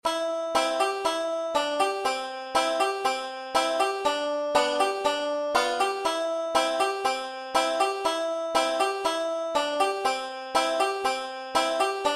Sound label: solo, banjo; 5 string; aunt rhody